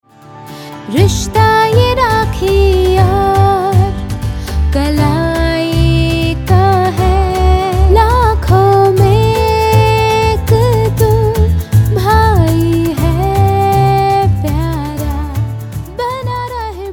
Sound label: female Hindi